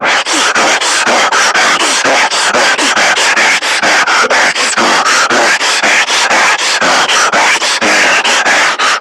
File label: Screamer Breathing Running Loop; Warframe; Lasrian